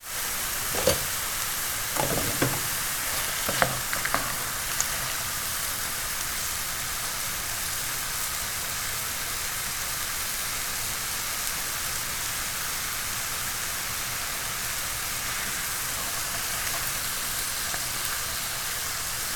food cooking stirfry frying wok then heat off sizzle